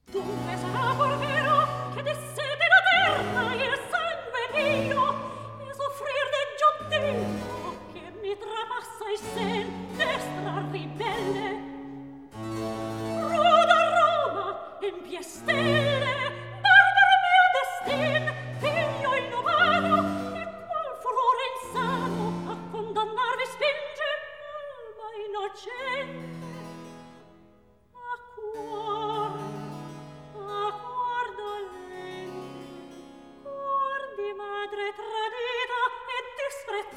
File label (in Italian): Recitativo; Agrippina condotta a morire HWV 110; Dunque sara pur vero; Handel Masterworks; Klassika